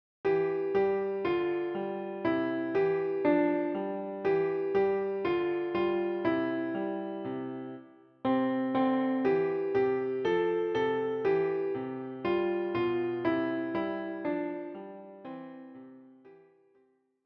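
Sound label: piano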